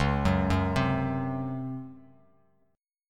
C sharp Major 7th Suspended 4th C sharp Bass Standard; C#M7sus4 arpeggiated; JGuitar